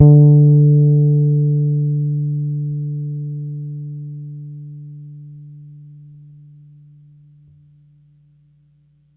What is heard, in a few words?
D4 hard, Bass; Pedulla Thunderbass; Giga Samples Collection